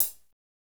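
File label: hi-hat; Northstar; Drumscapes Roland; A C Kit 1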